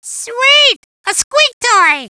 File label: Squeak Toy Pickup; Sweet! A Squeak Toy!; Gruntz